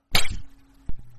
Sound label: ruler slap sound effect; baldi